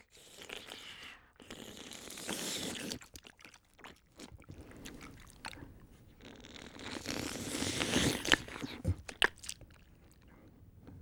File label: Monster Slobber; Animals; Sony Pictures Sound Effects Series Volumes 1-10; Sony Creative Software